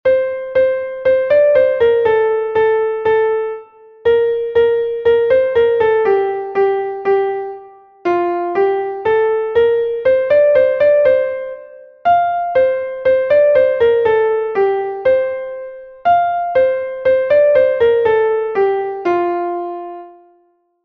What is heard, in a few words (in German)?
klavier; MelodieMeister